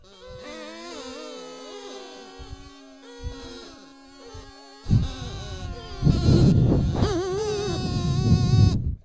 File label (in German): dudelsack